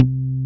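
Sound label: Pluck Bass; Best Service Gigapack 2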